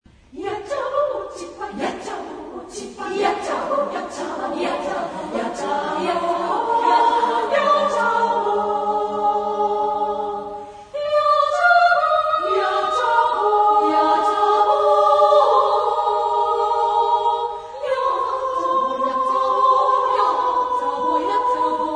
(female), chorus; Fukushima

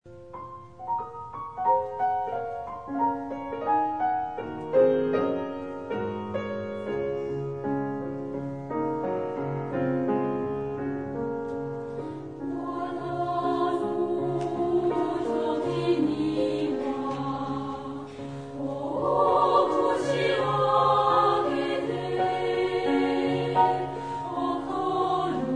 female, chorus; Matsushita

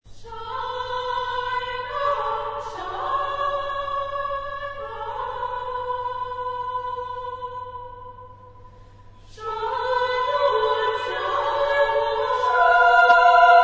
chorus; Anderson; Bright morning star

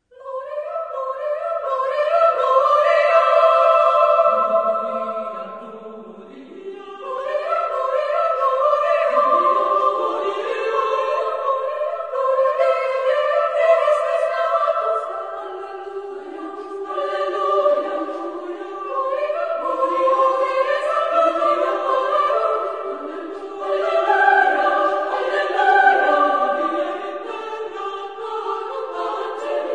(female), chorus; Hodie Christus natus est

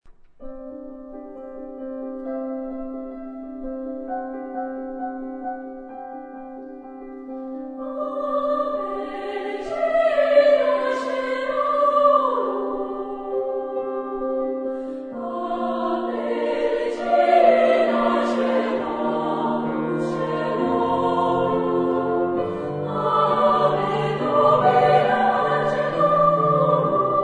female; Ave Regina Caelorum; chorus

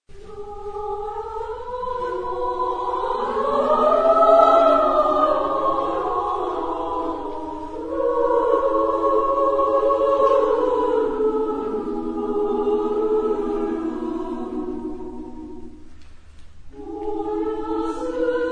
Yuki no komoriuta (female), chorus

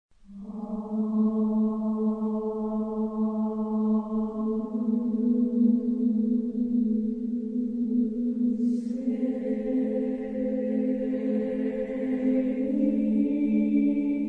female; Missa Trinitas; Agnus Dei; chorus

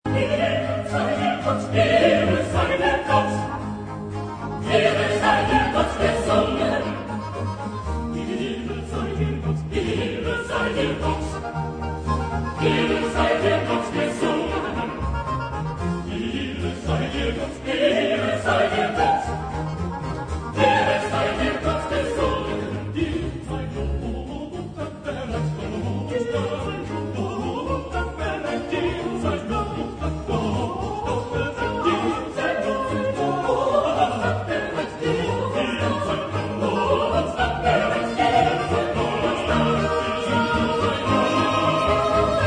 Christmas Oratorio, chorus; Bach; BWV248; Ehre sei dir Gott gesungen

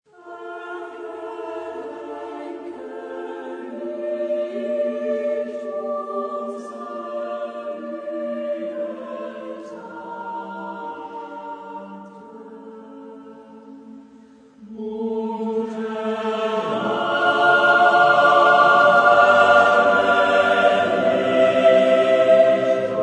Anthems, chorus; Mendelssohn; 6 Anthems Op79; Passion

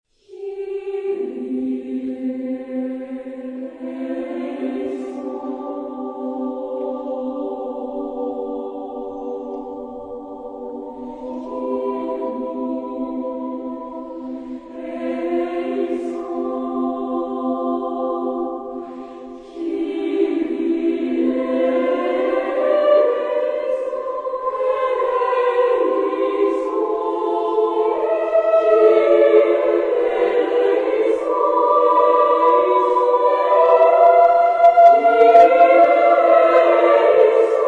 Kyrie, female; Missa Trinitas; chorus

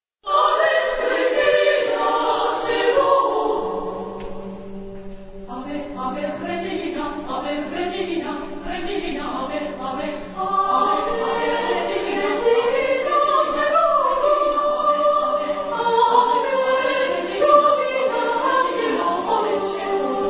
Ave regina coelorum, chorus; Matsushita

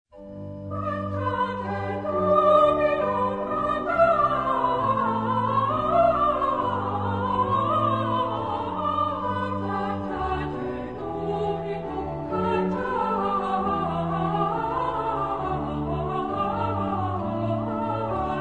chr sop bas; Buxtehude; Cantate Domino; BuxWV12

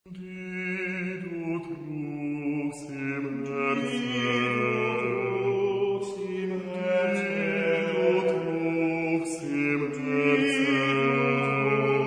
Album for Male Voices Fairest among Women, chorus; Grieg

